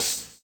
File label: HHO, Dance Mega Drums; Best Service